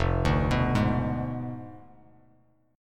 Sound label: F Diminished 7th F down Bass Standard; Fdim7 arpeggiated; JGuitar